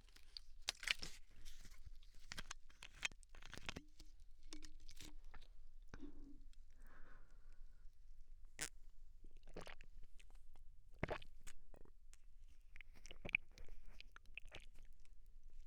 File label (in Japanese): 飲み込む 左; ボーイッシュなラバースーツ彼女に赤ちゃんにされて再スタートする人生